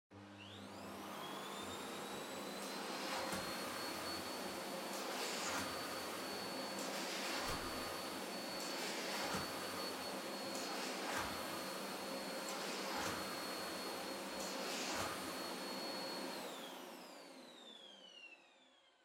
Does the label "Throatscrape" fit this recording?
No